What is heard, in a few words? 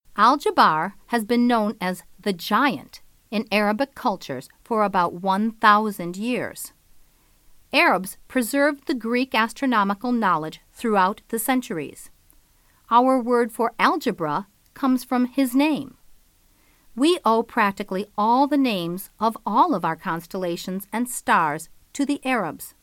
Arabic; pagesofstars